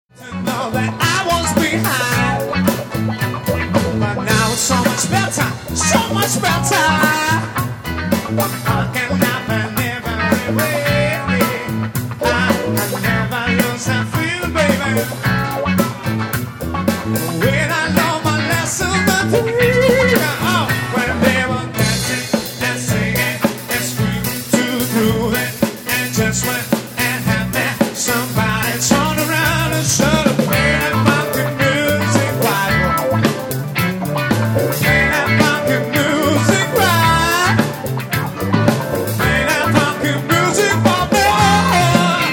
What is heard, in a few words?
BandDemo